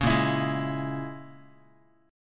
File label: A sharp Minor 7th Flat 5th, Guitar; strummed; 4,6,7,6,x,x; 4A,4E,5A,5D,5G#,6C#; JGuitar